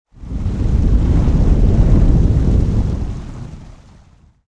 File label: Under water